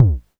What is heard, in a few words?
polyvox bassdrum; Formanta Radio Plant